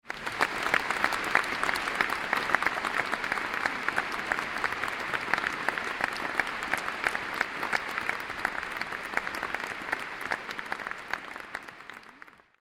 epic, Fishing

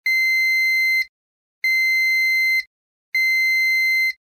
beep

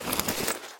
pick

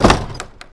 ram

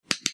power on off

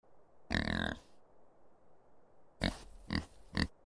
pig

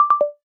tempo off, speedo

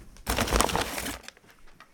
trash

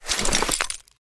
loot

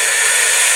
turbo, Vehicles

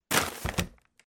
trash plastic

